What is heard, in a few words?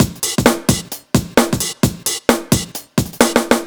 131 Live Breaks Fill, Drum Loops; Zero-G; Soundclash Nu Dance Electro; Acidized Wavs; 131bpm